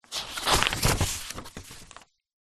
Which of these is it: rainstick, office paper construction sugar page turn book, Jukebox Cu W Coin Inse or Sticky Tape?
office paper construction sugar page turn book